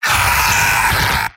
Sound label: soldier pain severe; mvm